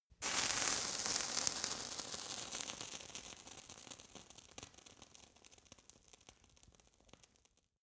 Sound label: fire torch sizzle crackling